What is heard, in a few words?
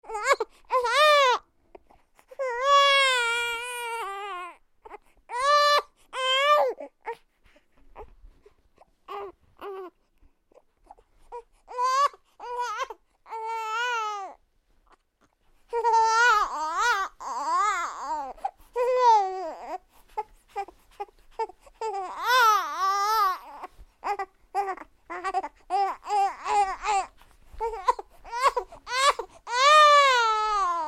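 newborn crying sounds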